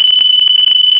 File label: Scan; Galactic Trek 1.6.1